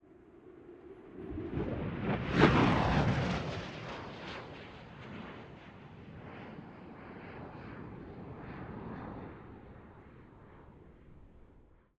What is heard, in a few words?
Jet, Fighter, F-16, Fighting Falcon, Medium Distant, By, Whip, Buffet; Airborne Sound; Jet Fighter Maneuvers